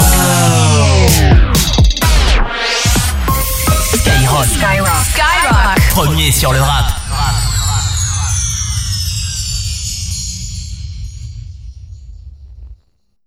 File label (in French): JINGLE NOEL; COMPACTÉ SKY; SKYROCK